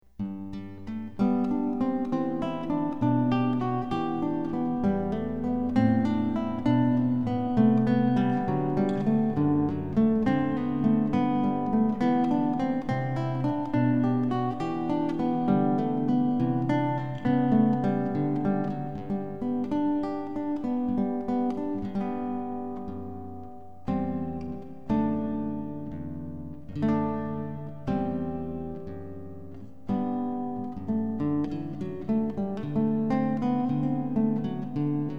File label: Jesu Joy of Mans Desiring, classical guitar; Bach